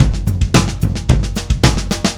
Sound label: Drums Fill, Indie Rock; Session 01 - Brighton; Sonic Foundry (Sony Creative Software); Indie Rock Anthems